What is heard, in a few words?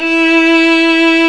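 ARCO VIOL right, Solo Violin; E-MU Producer Series Vol. 2; More Studio Essentials; Composer; St. Arco Solo Violn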